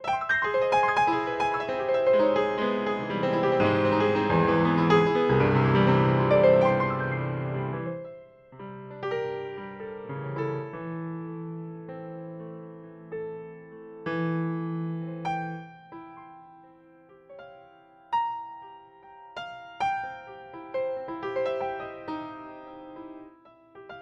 Grand Piano

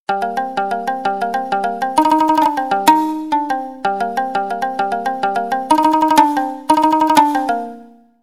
Ukelele Trem Verb

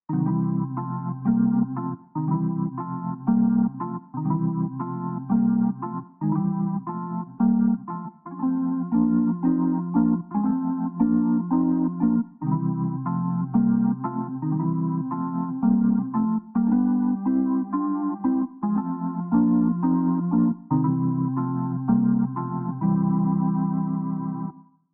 New Organ

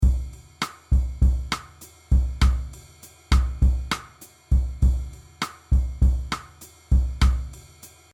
Jazz Bossa 100

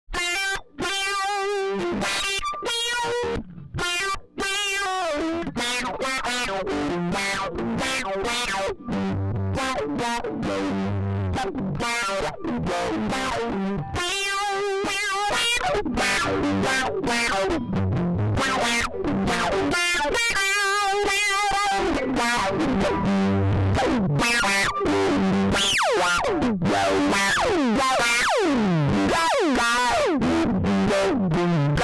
Quacker Tube Zipper

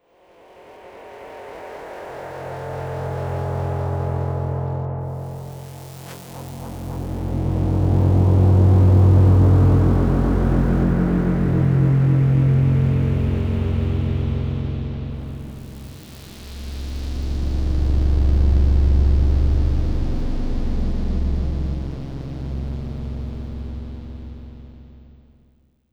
scoring pack drone

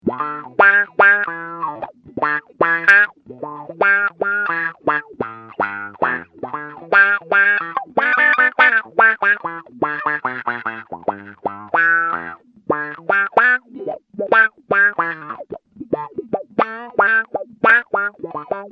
Q-Tron low wah; ELH QTRON

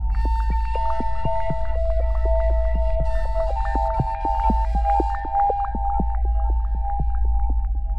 tribal flute beat

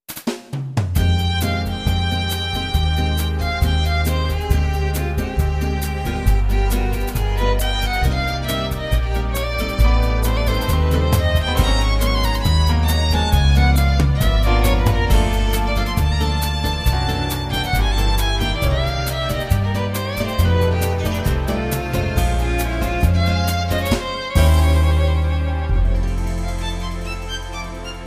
violin

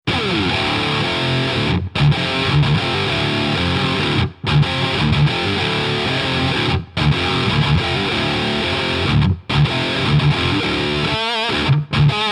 Evil Nu Metal

